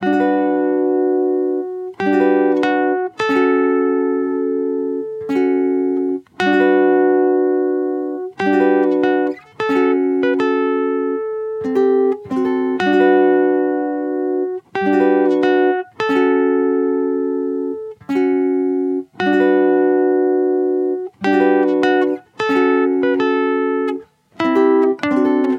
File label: Combo D Major 75 BPM